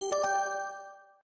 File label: buttons; billards